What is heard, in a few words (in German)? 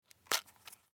Lutschbonbon